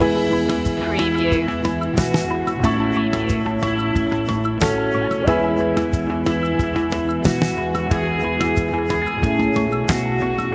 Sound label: rumble in the jungle loop